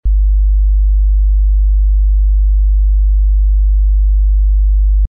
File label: sine 50 Hz; isosonie